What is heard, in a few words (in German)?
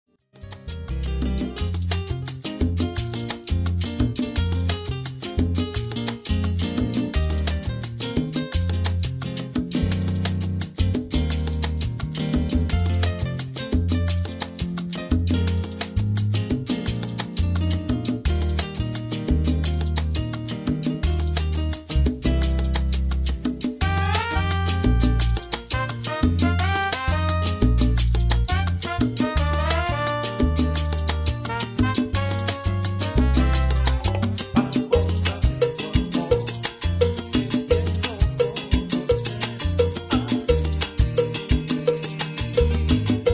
Latinjazz